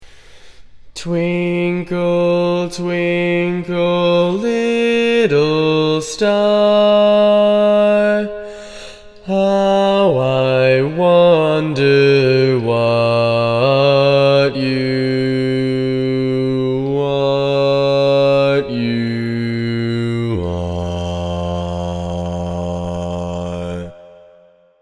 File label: Bass